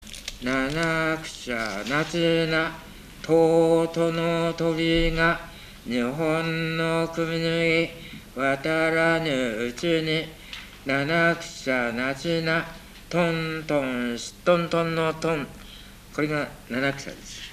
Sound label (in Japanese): warabeuta